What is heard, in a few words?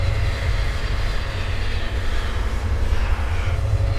Noise Loop, sparse soundscape